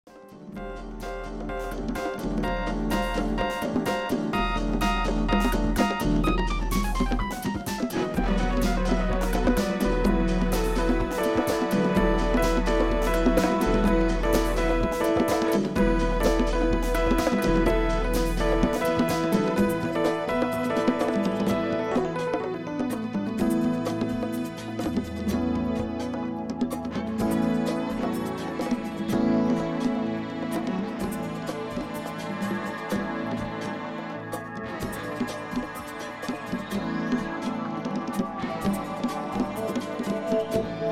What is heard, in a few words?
cello chill sounds